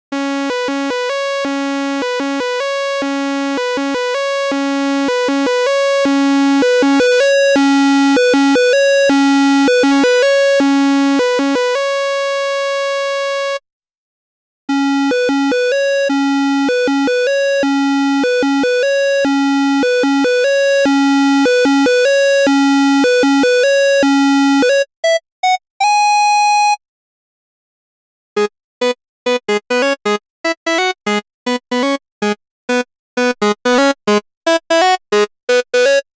Moog Sub25 Feedback Saw Sqr Mix

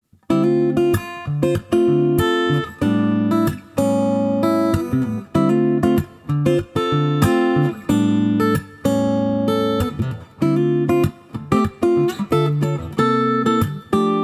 Fender Acoustasonic Jazzmaster

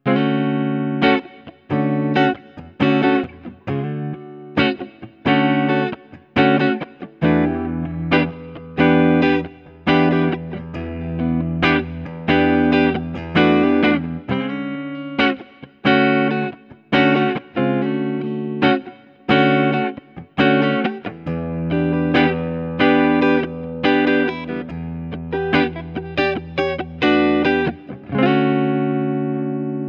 Neck to Bridge Alternate Switch down; Ibanez AZS2200 Prestige

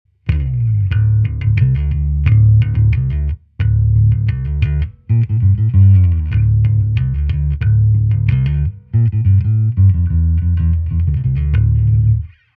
Preci Fullboost

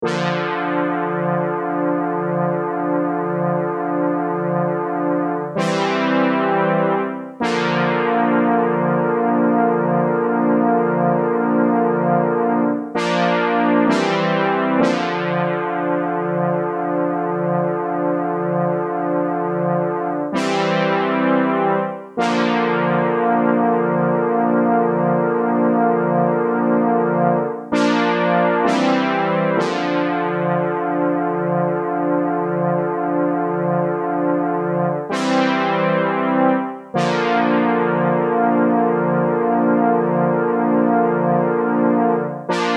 JX3P House Baby Pad wet

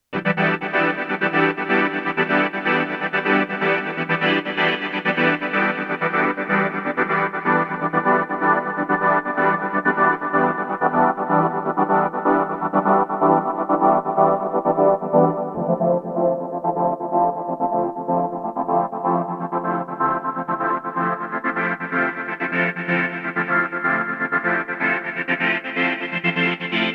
Sh-4d Model Sh4d Trance Chord

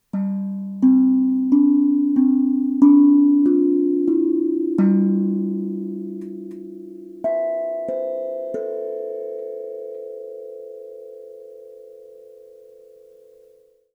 Electro-Voice RE20 Aquadrum

